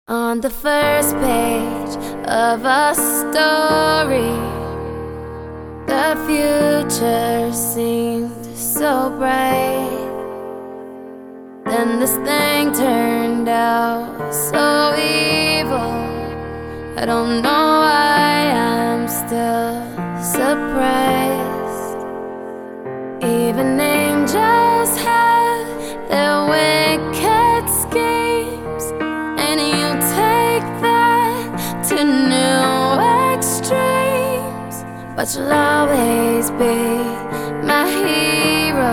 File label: piano version